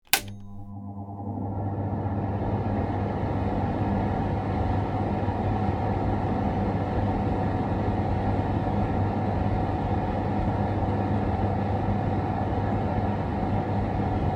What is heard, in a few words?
Extractor hood fan turning on and off; appliances